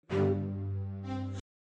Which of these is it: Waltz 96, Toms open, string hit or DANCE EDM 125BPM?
string hit